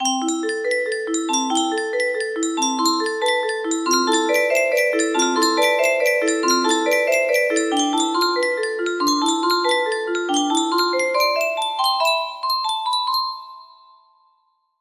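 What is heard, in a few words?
playing with rising and falling, music box melody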